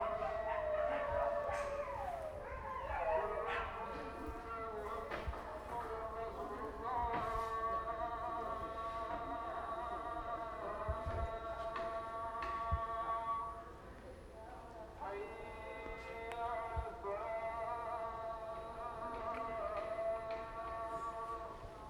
Mosque and dogs at Sulka shipyards, South India street recordings